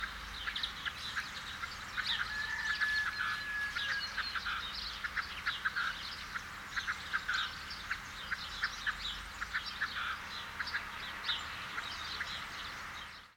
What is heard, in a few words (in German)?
rothuhn